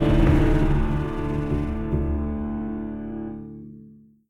combat jingle speed; healers quest; android ios mobile gamerip

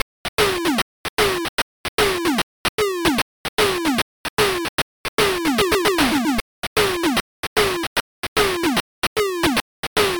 Main Music; Woody Pop Master System

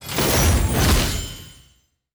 adventure level unlock; Animation Throwdown; cardbabies

